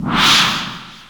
Zip; Geschicklichkeit; Hamsterball

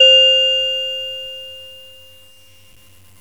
Glockenspiel; GMPlay; Chromatic Percussion

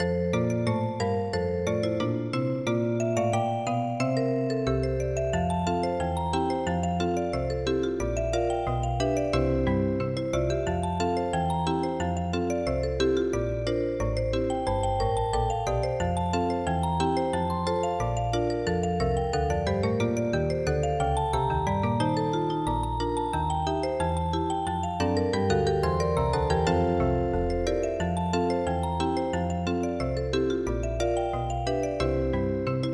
RAGTIME; cembalo

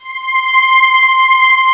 Warm Pad C6; Star Trek JR Audio

